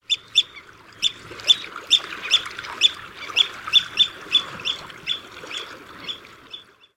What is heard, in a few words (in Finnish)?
meriharakka; Kosteikot